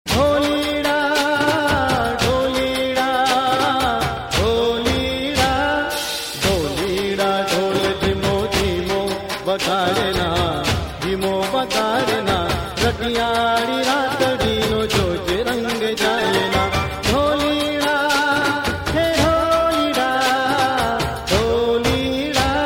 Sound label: Garba